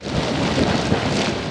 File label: Rage Blow